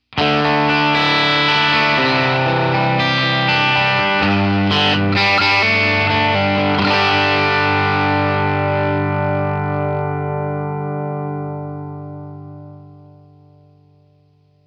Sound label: rock, guitars